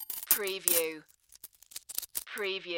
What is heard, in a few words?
Coins Moving in Hand